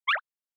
Anime Motion Fast

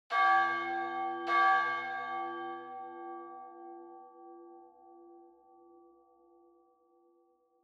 Church Bell Far Mid

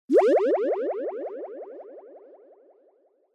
Motion Pop

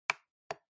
Trackpad Click L

